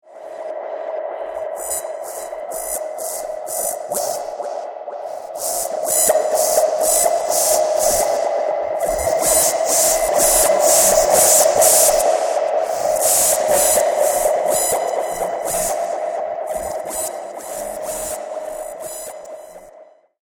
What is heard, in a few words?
Industrial Alien Ambience, scary soundscape; star wars / trek style games; Andrew Fleming